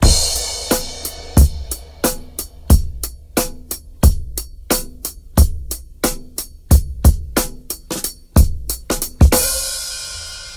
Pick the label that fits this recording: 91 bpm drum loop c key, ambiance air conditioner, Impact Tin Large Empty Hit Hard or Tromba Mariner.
91 bpm drum loop c key